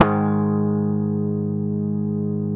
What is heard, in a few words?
G#, Bass; Sampleheads; Will Lee Bass Library